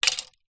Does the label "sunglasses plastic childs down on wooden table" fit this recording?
Yes